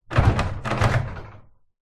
household garage door wooden closed impacts rattling; zapsplat